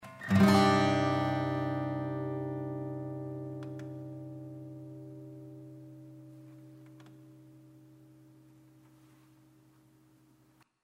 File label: creaky Chair before